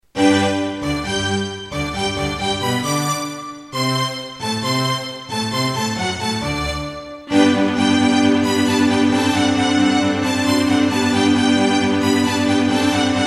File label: Yamaha MU2000EX GS MODE; Mozart - Eine Kleine Nachtmusik partial; classical